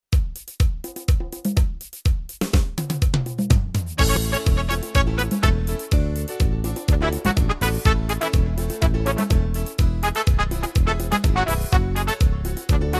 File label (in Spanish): ritmos yamaha merengue